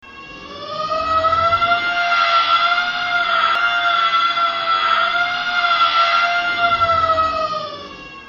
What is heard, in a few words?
dictator siren; ninjapuppy